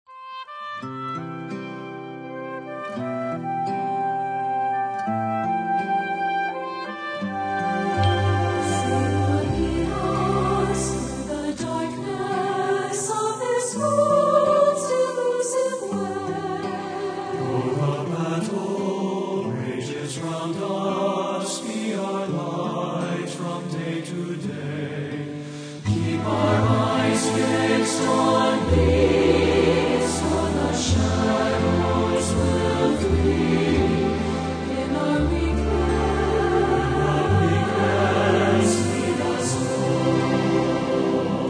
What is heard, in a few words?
Choir And Orchestra